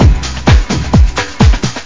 breakbeat; algomusic; rhythm

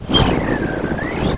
Magic; Majesty; OnlineGame

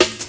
snare

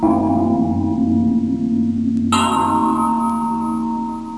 gong loop

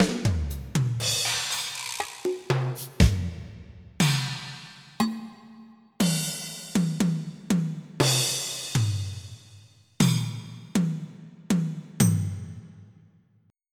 Standard1; Onj's Soundfont

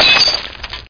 GLASS; Wired for Sound Pro CD; Aristosoft, Inc.; funnysnd

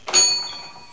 shop bell; Toyshop on Earth; OmniMedia

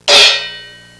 CHOKE 18, PERC

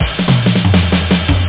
drummer; Loop Amiga 8-bit

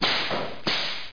CATAPULT; War3demo

